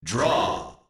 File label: DRAW; FZGX